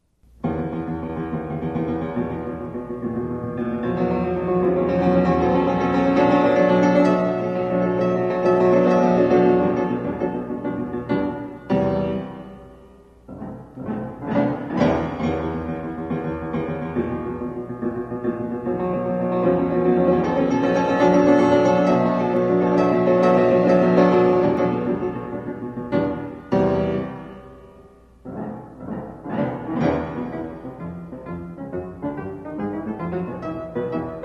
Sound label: mephisto waltz, piano; liszt; Mephisto valtz